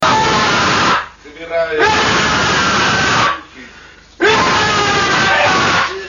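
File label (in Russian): крик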